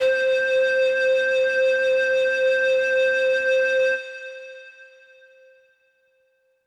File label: Synth C, Synth Prophet One Shots; Electronisounds